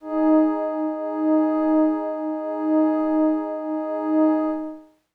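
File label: Glass Howl Kawaii G sharp 6; pads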